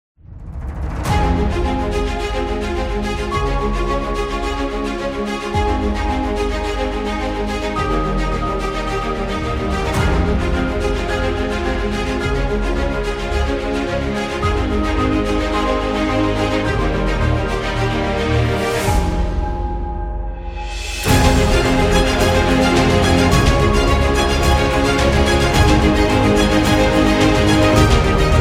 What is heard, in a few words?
Epic Cinematic